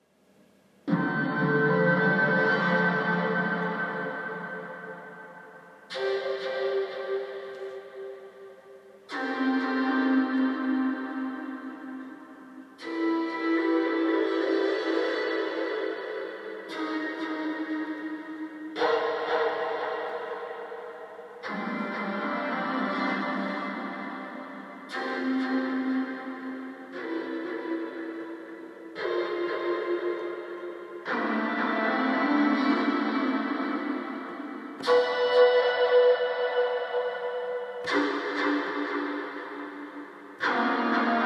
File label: space echo